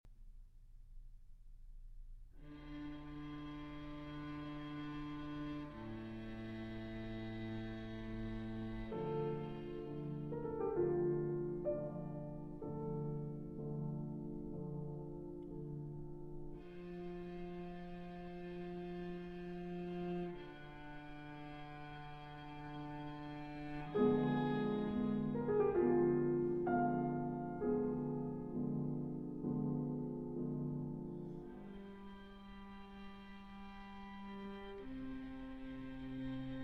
Piano Trio No. 5 in D Major, Largo Assai Ed Espressivo; Op. 70 No. 1 Ghost